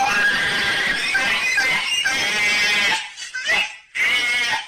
goofy ahh laugh; meme

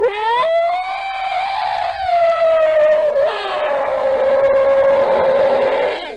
decaying winter scream; sickler

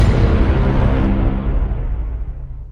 sudden suspense boom

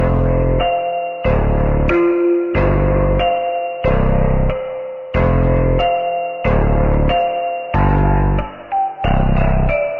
countdown sound; fnaf ransomware

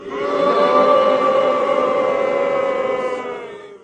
crowd boo

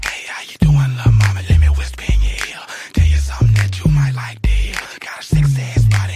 whisper song

